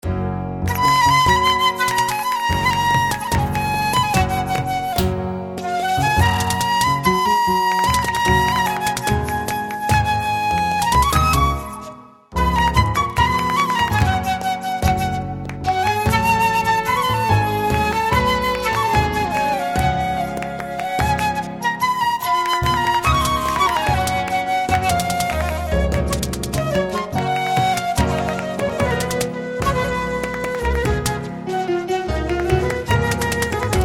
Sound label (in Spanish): Alegri segun, flamenco flute